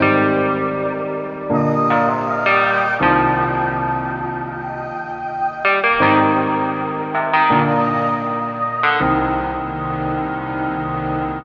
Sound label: guitar, Sad